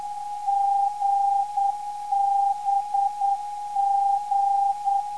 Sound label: 426kHz, NDB; GBG